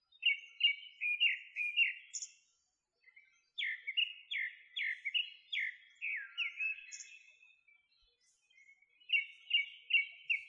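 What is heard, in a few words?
kurotsugumi song, Japanese thrush; saezuri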